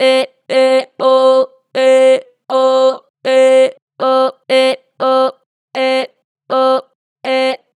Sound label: "Eh Oh", Harmor Vocals, Pitch Flat Strong